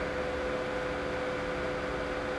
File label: copier ambience